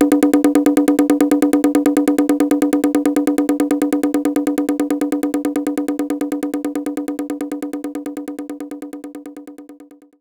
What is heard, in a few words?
Tumba Low